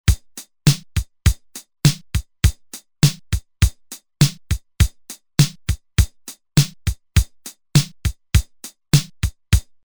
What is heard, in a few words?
8 Beat Rock, Roland TR-505; Factory Rhythms